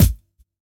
BD; Best Service; Dance Mega Drums

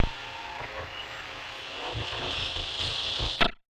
Casio, Glitched, malfunctioning synth

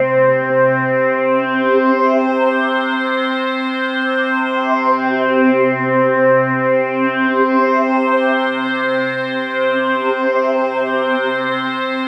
Roland JV 2080 LFO C3; MISSION LFO

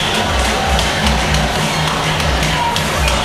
people; Q Up Arts; Dream Experience; FILM TOOLS

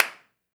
clap, Roland MV8800

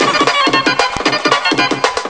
tap sample; Amiga Soundtracker Sample Packs

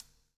COPS STK; Spectrasonics - Backbeat

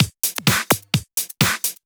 Clubhouse Loop 128 BPM

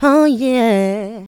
Vocals, Adlibs; Houseworx

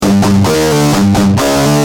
G Jam, Guitar; Industrial

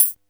TAMBA, PERCUSSION; AKAI S6000 CD-ROM - Volume 1

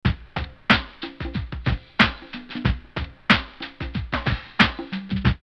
old gold 92, drum loops, old school; X-Static Goldmine

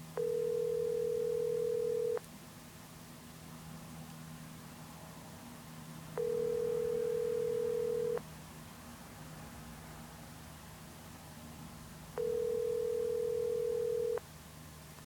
american ringback, phone

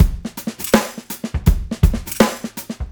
SIX POLY, CLEAN KIT; Tuna Beats

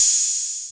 HH HH, Dance

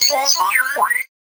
VocoBit Noise, 8-bit; musicradar; 8-bit bonanza

